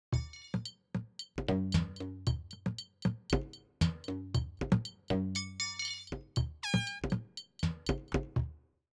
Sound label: Simmons SD1200 Perc Streetbeat